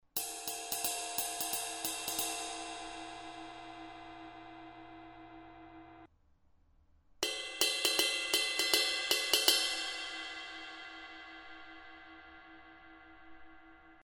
Paiste Twenty Masters Collection 22 Inch Dark Crisp Ride Ride Bell